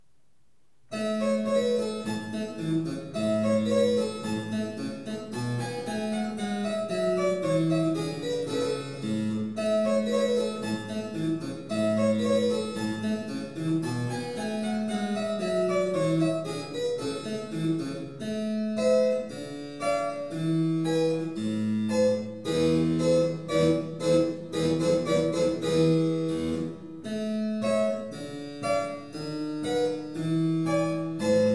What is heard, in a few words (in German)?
Cembalo; mozart